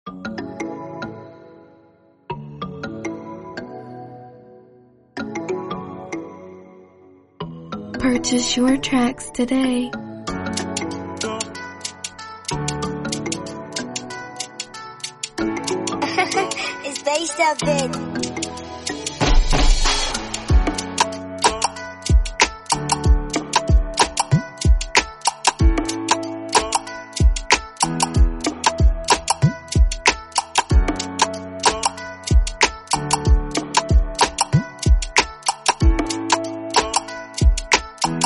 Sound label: Sweet Afrobeat Instrumental